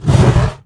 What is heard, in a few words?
HISS; outlaws